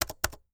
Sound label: Technology Computer Laptop Keyboard Pushing Space Bar